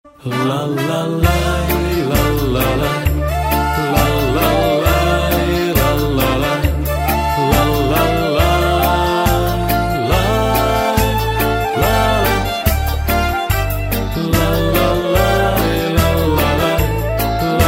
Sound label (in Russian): evrejskaya radost